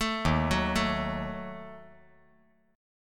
D sharp Diminished D sharp

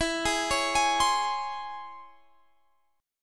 C sharp Minor Major 7th, chord

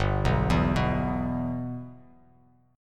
G 6th; G6 arpeggiated

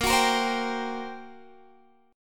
G Minor Major 7th Double Flat 5th, A sharp

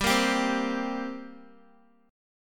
G-Minor Double Flat 5th G down Guitar; strummed; JGuitar

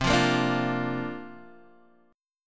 C 9th Suspended 4th, Guitar, DADGAD

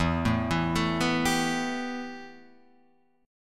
A 7th Suspended 2nd, E

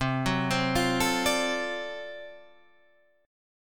D 7th Sharp 9th C, chord

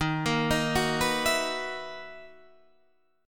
B Major 11th Eb, guitar

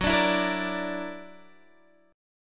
Gb Augmented 7th, Guitar Half Step Down

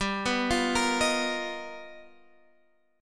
G 7th Sharp 9th Sharp 5th G chord; G7#9#5 arpeggiated; JGuitar

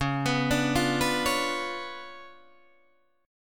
Db 7th Flat 9th, Guitar, Open D

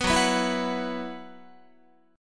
Gb 7th Suspended 4th Gb down Guitar, chord; Gb7sus4 strummed

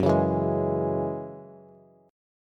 Gb 7th Suspended 2nd Gb Bass, Gb7sus2 Chord; strummed; JGuitar

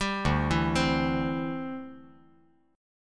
D 9th Suspended 4th D down

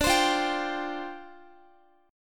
D Minor 11th D, Guitar; Dm11 strummed; JGuitar

